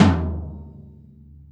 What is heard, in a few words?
Tom Live, Lounge Single Drum Hitz